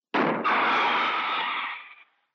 zvuk shiny

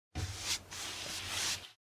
zvuk kisti; Короткие звуки для монтажа — для transition, движения, смены кадра, звуки для выхода эффек

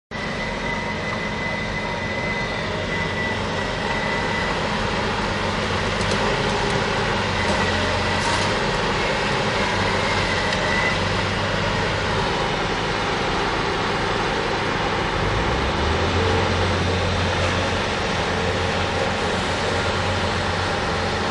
Rabota traktora cherpalki na razrushenii ravnomerno; Звуки строительных работ

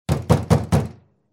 trebovatelno kulakom

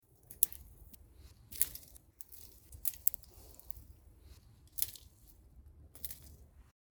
legkie hrusty

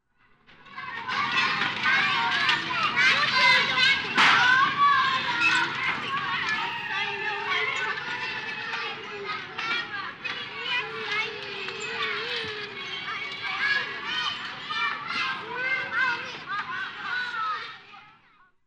deti shumno igrajut vo dvore; Звук играющих на улице детей

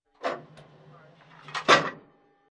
Zvuk tanka; Звук танка; Звук танка в движении, заводка, старт, остановка внутри и снаружи. Выстрелы танка

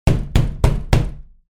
ladonju po derevjannoj; Звук стука в дверь

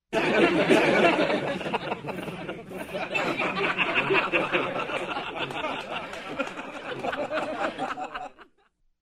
smeh nebolshogo kolichestva ludey; Звук смеха

